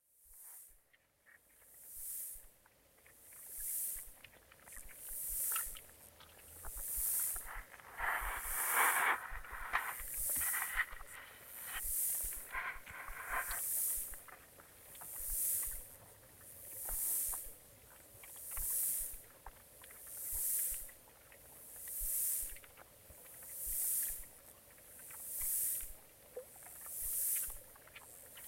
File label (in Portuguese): Nodar Insectos